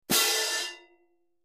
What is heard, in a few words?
18in Stadium Medium Pair, cymbal sound